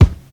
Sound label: raw steel kick drum d sharp key